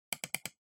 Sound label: PC Mouse L Double